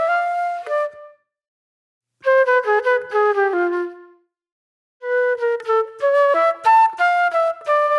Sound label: Reflective Flute